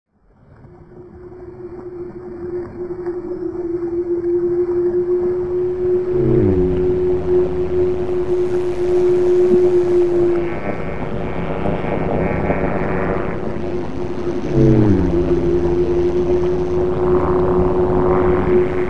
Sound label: static whales